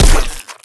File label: peashooter pea cannon core mono; aquafina